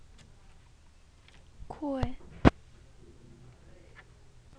coin

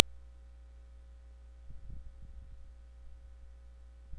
laugh

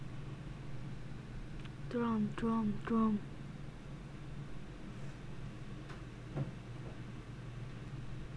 drum

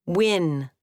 win

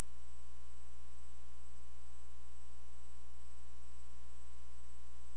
door

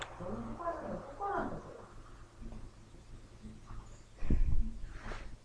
hiss

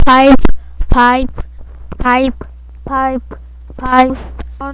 pipe

